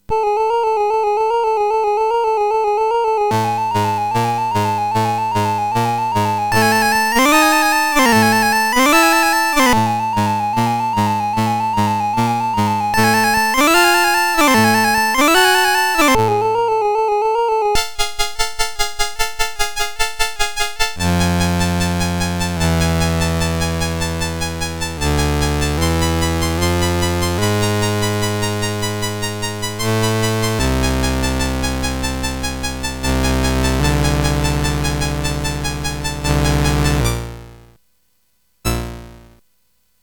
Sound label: sid CSG8580R5, GAMES; Crush Crumble and Chomp